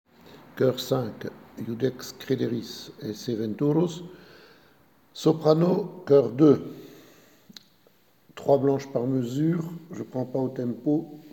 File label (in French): Choeur Soprani